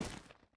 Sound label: footstep hide stones run; Rust